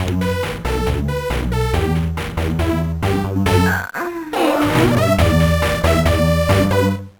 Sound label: Bathroom Jam